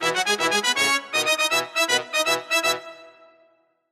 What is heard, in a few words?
victory, jingle